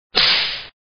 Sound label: Whip